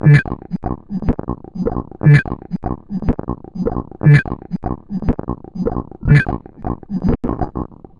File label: Electric Pig, Electronica Noise Circuits